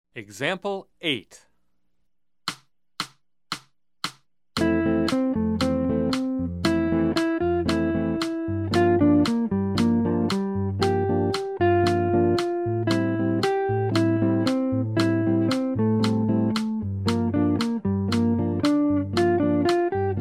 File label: Jazz; jue shi ji ta